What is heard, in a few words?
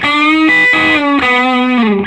SOLO, Vintage Blues Guitar